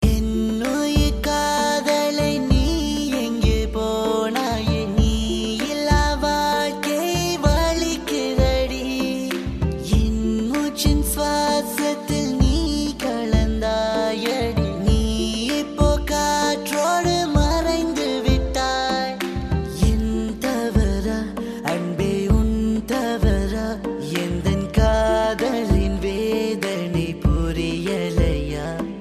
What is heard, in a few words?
Tamil Love Ringtone